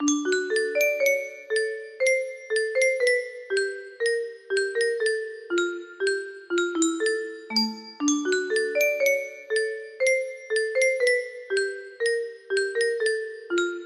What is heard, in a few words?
music box melody